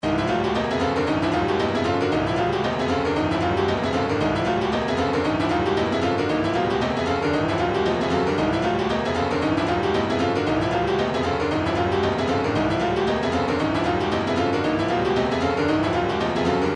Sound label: retro funk, midi; Good Feet